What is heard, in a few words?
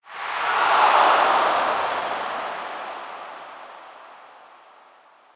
bonus Game Miss; football